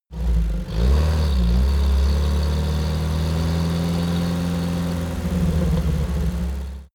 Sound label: car uaz 469 gear accelerate